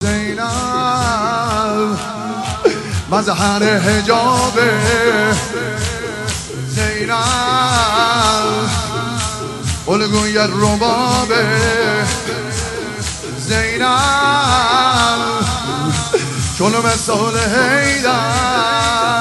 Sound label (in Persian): مداحی